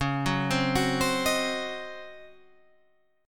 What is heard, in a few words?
C sharp Major 7th Suspended 2nd Suspended 4th C sharp